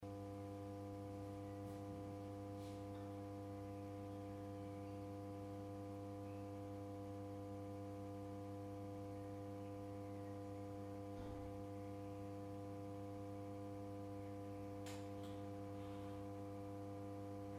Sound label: washing machine, field recs